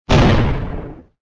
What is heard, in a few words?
small explosion